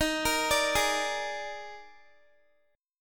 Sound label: Eb Major 7th Suspended 4th, Guitar; EbM7sus4 arpeggiated